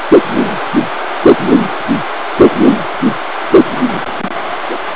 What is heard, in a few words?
Normal Doppler